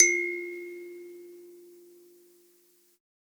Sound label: Ikembe F1; Multisamples; Ableton Live 12 Lite; Core Library